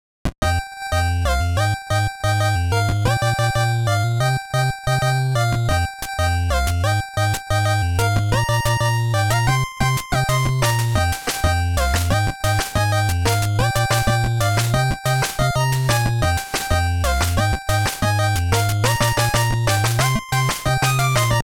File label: break beats